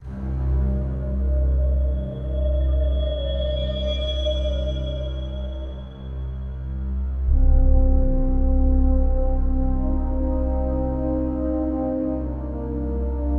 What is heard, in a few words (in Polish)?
Ambient I Akcja